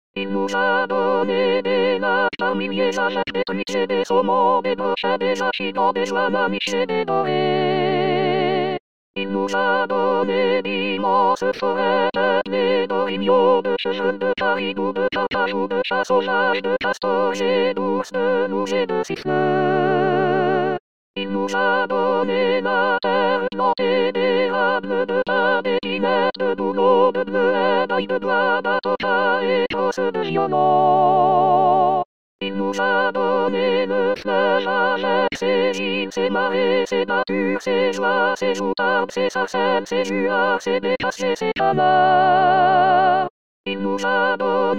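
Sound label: soprano; messe queb 12 chant de sortie extract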